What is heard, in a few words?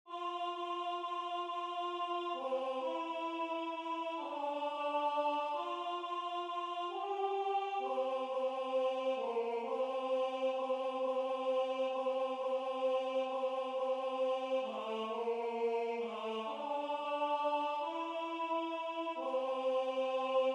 Alto